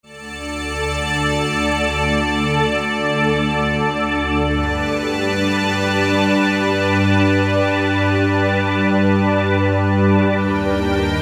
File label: Pivotal Pad